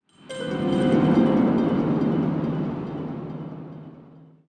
Mystic Eerie

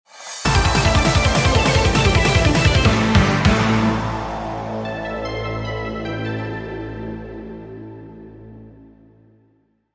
victory jingle; Security Breach FNAF Fury's Rage

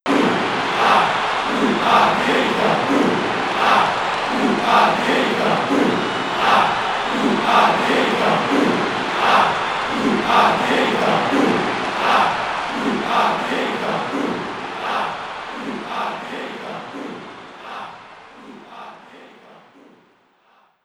Crowd Chant; mario strikers charged football wii gamerip 2007; Diddy Kong

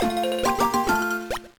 Fanfare Berry Get; Pokemon LeafGreen; gba

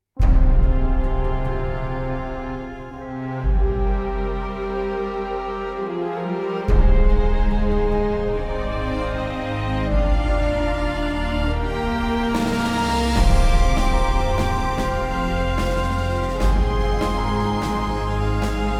Gameover Victory; Endless Legend

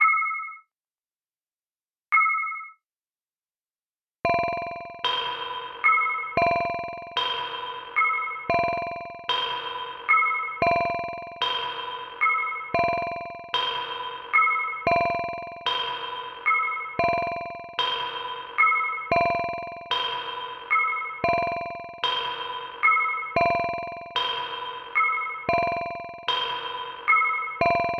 Underwater; Super Mario Bros. 3

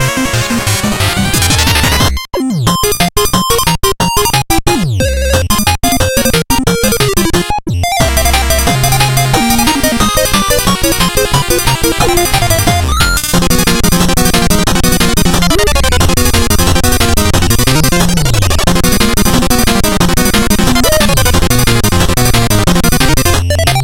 Music Boss; Boss Tinker; Shovel Knight King of Cards

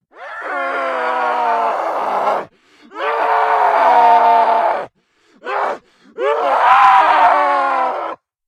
Scream

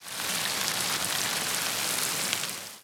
Water Droplets

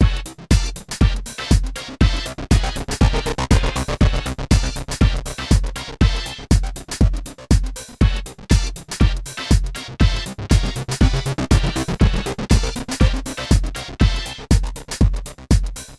Results (Piano); Wii Play; Laser Hockey

